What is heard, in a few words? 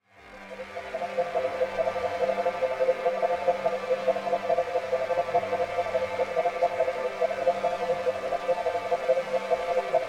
Drone Filter Flange Pulse; Production Element Imaging Element Texture Bed Atmosphere Synth; 5.1 STEREO MIX; Blastwave